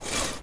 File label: metal slide sand; objects; Star Wars Obi-Wan; XBOX